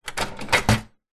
office slide projector vintage set down table 1960 voigtlander perkeo automatic; zapsplat